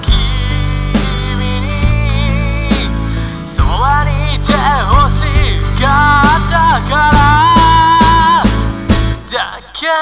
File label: beats